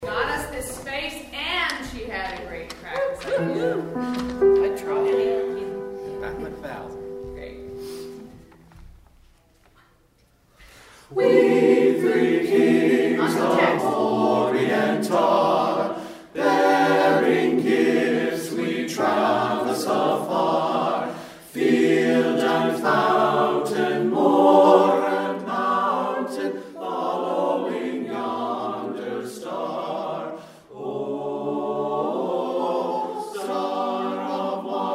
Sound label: we three kings no claps